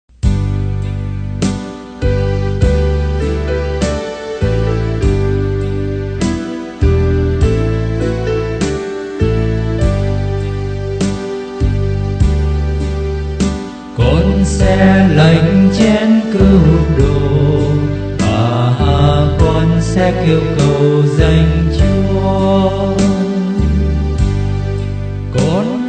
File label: BASS